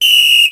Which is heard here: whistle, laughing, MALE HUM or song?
whistle